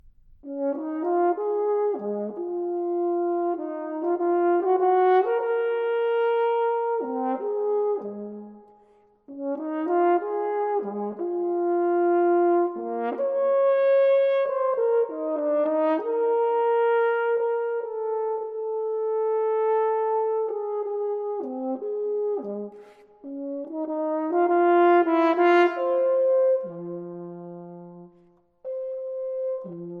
Sonata For Horn Solo, Moderato Espressivo, Andante Cantablile, Piu mosso